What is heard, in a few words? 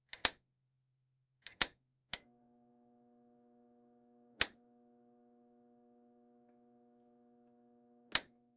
studio, sound-effects